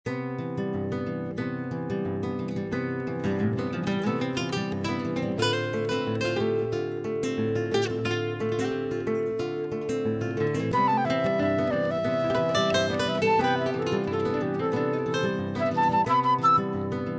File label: Jazz Recorder, loopable